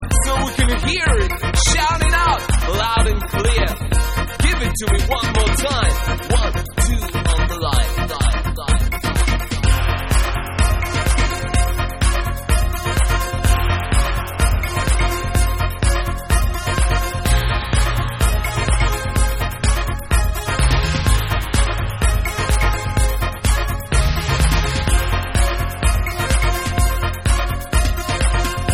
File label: Atmospheric Mix